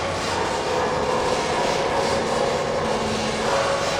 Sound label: Noise Loop, sparse soundscape